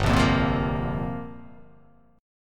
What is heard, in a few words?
G Minor 11th, chord; Gm11 strummed; JGuitar